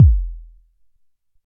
round kick drum one shot c sharp key

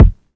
short steel kick drum sound f key; kick drum samples

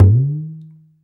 low floor tom drum single hit g key; tuned drums g key

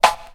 original steel snare drum g sharp key; Duration 0:01

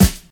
high quality clear snare f sharp key

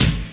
silky kick single shot a key; kick drum samples; drum single hits one shots

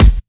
trebly kick single hit D# key; kick drum samples

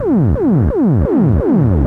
monodelay Em 174, synth; instruments; loops

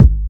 puffy kick drum single shot g sharp key

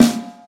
tight low end snare one shot a sharp key

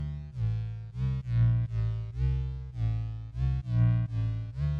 bass background lowpass; loops

